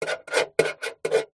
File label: office pen biro draw shape on metal box